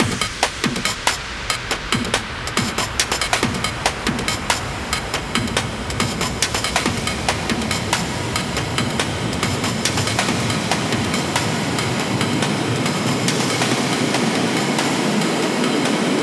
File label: 140BPM 16Bars, HandsUp & Trance Fills; Celebrity; Vengeance Samples